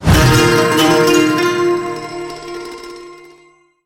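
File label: Inspiring Tune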